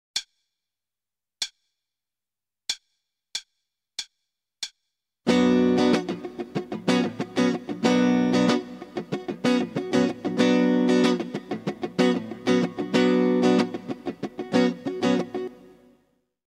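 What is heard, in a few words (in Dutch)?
Ritme, gitaarstarter; Meespeel CD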